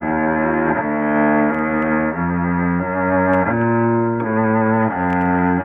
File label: strings, Sad